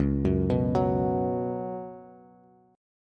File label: Db 7th Suspended 4th Db down Bass; JGuitar